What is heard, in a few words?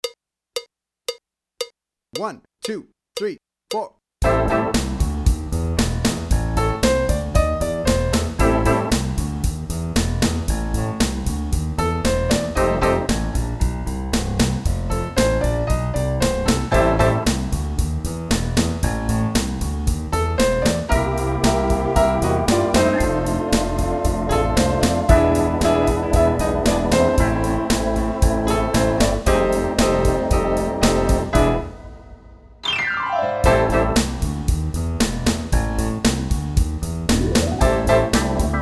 Boogie Blues Am